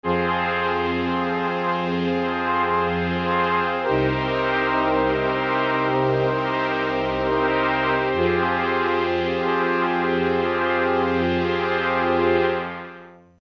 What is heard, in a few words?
strings; southpole; oldturbine